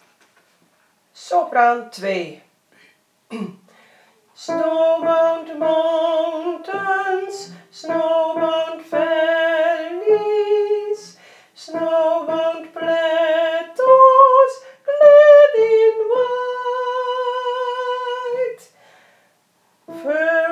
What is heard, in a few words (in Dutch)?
sopraan en sopr.2